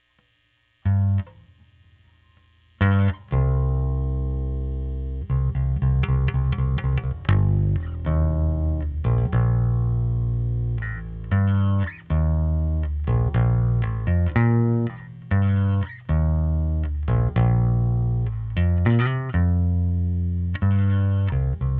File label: Bass OD